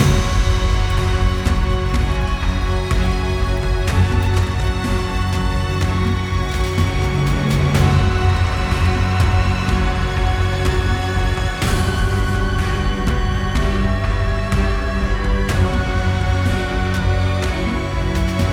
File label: Battle